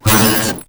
SOUND EFFECT, Metal; AKAI S6000 CD-ROM - Volume 1

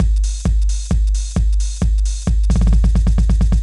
Beat Mix, 132bpm; Big Fish Audio - Old Skool House; OSH Construction Kits

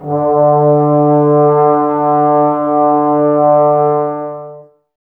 BRS TBS D, Woodwind Brass Ensemble, Brass

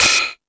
High Hat, Drum Machines

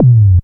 TOM MD; Back In Time Records; Big Bang

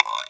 Guiro, Drum Machines; EMU Planet-Phatt

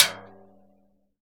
CASCARA, Synclavier Percussion; ILIO; Synclavier Percussion 2; World & Orchestral

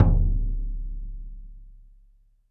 Roland TD-20 Percussion

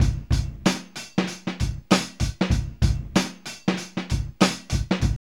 SHUFFLER 96, Funky Elements LOOPS; Zero-G

